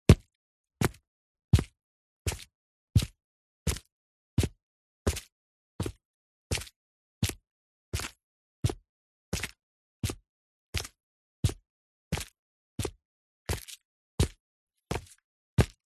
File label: foley footsteps sneakers trainers walking on gritty concrete slowly